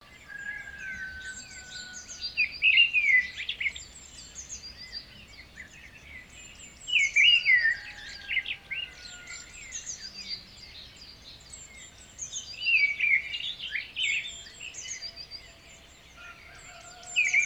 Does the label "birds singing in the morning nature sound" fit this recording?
Yes